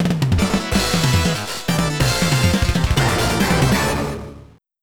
Stage Complete

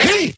Vocal Pitched "Hee"; bass house

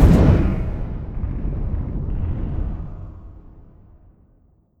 Magic Bomb

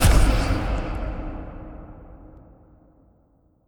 Magic Bomb

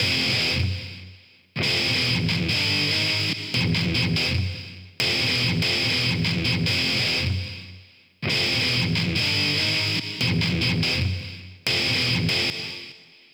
Bgm

